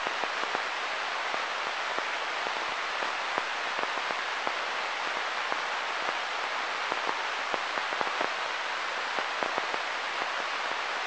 scanner noise; devices accessories utilities; NS Studios - ultra sound collection

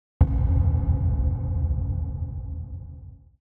Impact Drum Dull Low Wooden Reverberant Hit

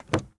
Truck Durango Door Open; Trucks

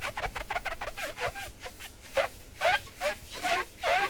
Squeak Polish Statue; SFX Kit

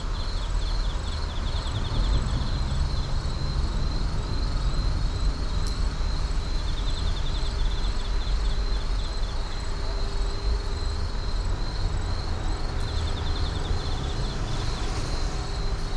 Urban park night insects numerous; urban and suburban; boom library

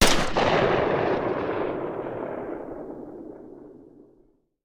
Hand Gun; Sound Ideas; Guns

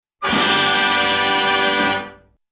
Fanfare 'Ta Da!'; Cartoon; Hollywood Edge; Cartoon Trax

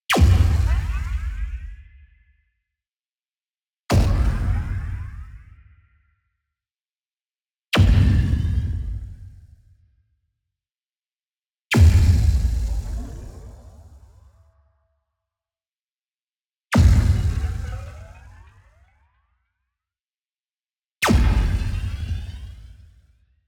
EXPLOSION SHORT Shot Ascending; boom library; Anime Essentials

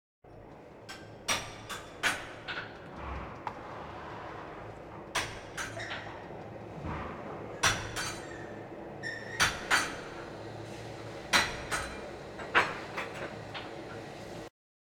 Animal effects snorts grunts farm ambience

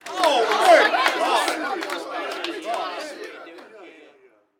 street ball crowd boo; SFX Kit; Crowds

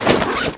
Pickup Armour Flak Vest; Pickups

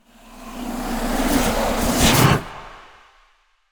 Ice Spell Summoning Calling Ice Spirits; Arctic Aura

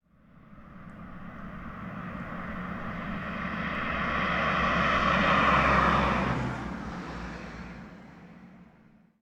Auto Sedan Passby; Automobiles

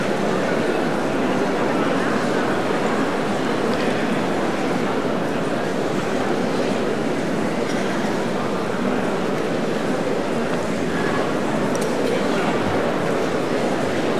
crowd concert hall; best service Blue Box; crowds interior

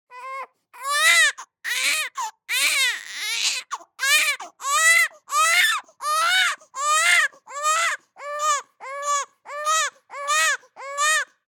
BABIES SCREAM Boy 3 months Heavy; Baby Boom

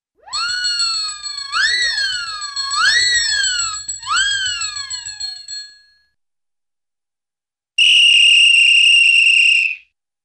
Whistles & Bell, Cartoon; Hollywood Edge; Cartoon Trax

